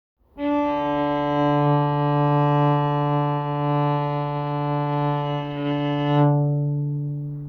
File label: cello d3; tuner